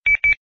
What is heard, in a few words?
nextel incoming